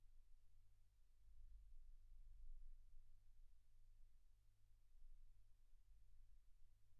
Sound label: silence 48kHz